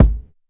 thumper kick; Drum Kit Kicks

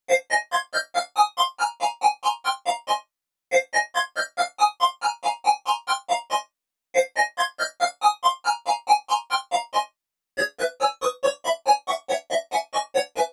loop 140, Melody Loops; cheatcodes; TRUTH-HZ; Crowdsourced Sample Pack

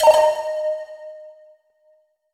Eski Flute, Digital FX; Crowdsourced Sample Pack

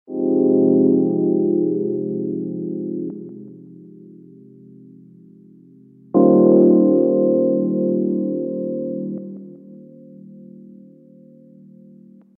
rhody rhodes 79BPM, Pads; Crowdsourced Sample Pack

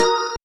twahl; Truth-Hz - 1-Hit KO Sample Pack

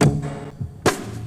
PERC, Foley; NOVUMFLEX; TRUTH-HZ; Crowdsourced Sample Pack

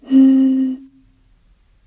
Blowing bottle; Brass & Wind; Crowdsourced Sample Pack

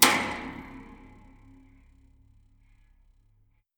Toy Drum Snare; Crowdsourced Sample Pack; Drum Kit; Claps & Snares

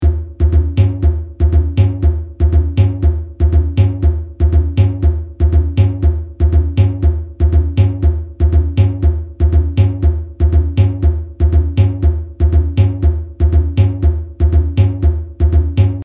RHYTHM SECTION, Drum Kit, Percussion; Crowdsourced Sample Pack; TRUTH-HZ

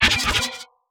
Perc; TRUTH-HZ; Crowdsourced Sample Pack; Digital FX

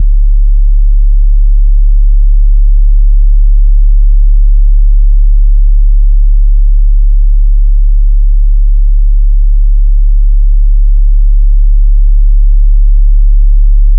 SUB OSC; Crowdsourced Sample Pack; Drum Kit; 808s & Subs

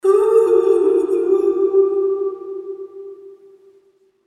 ooh, Vocals; TRUTH-HZ; Crowdsourced Sample Pack

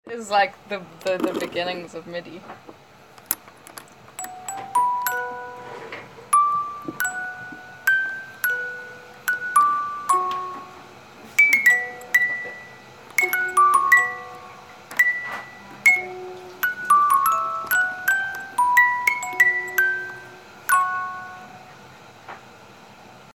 music box; TRUTH-HZ; Crowdsourced Sample Pack

